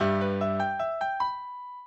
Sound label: minuet, mozart